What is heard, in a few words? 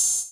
Hats Electro Open